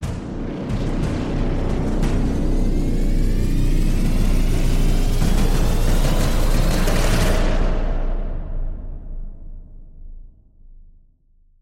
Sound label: trail swell; soundscrate